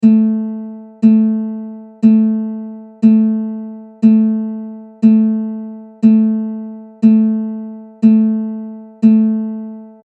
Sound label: ADFB A Low, uke